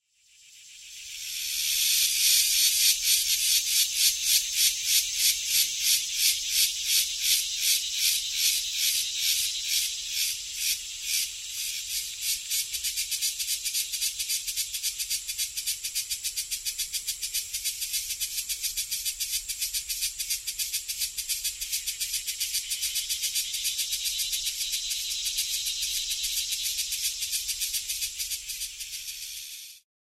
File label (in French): chant des cigales